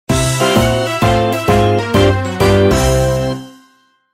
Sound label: Minigame Draw; MP7